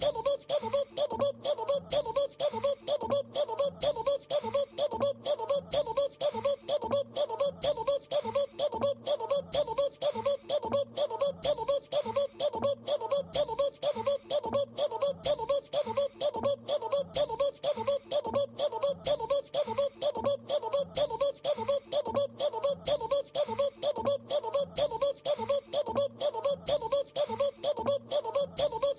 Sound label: Beep; Krave Cereal